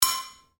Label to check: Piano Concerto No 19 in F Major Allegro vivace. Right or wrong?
Wrong